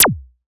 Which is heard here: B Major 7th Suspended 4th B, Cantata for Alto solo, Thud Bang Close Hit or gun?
gun